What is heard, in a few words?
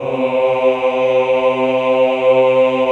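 choir C2, Ooh; Sound & Vision - Gigapack I CD 2 (Roland)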